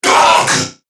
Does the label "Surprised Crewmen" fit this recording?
Yes